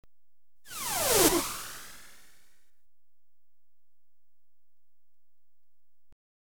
Missile Ricochets Right To Left, Electronically Generated Sounds; BBC Sound Effects Library